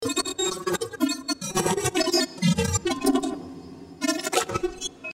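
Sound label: percussion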